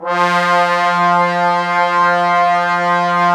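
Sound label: brass trombones F, Woodwind Brass Ensemble; 90 s Sample CDs; Miroslav Vitous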